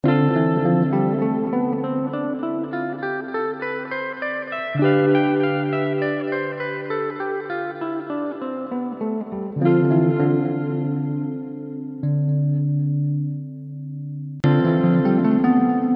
Modos Gregos Re Dorico

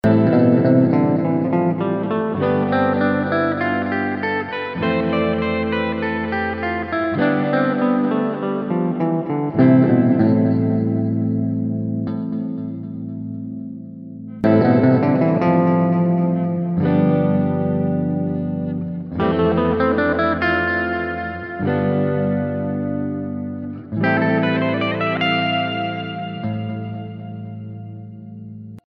Modos Gregos La Eolio

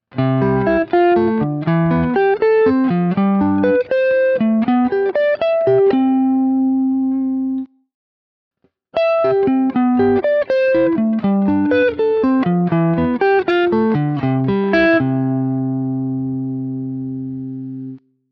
triades abertas melodico sobe desce

